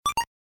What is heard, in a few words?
sound points